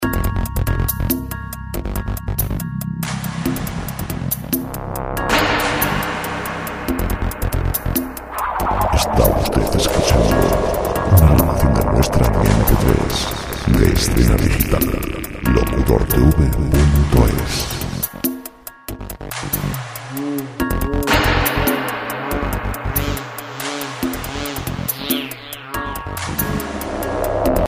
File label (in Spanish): misterio; musica libreria 2010